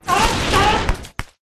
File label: Seal, pets